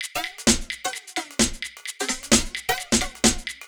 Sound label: Drum Loop 130, Downtown House; Drum Loops 130bpm